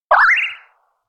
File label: wink; anemoi